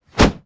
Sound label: zombi swing, melee miss; the monster plane v2; kolmods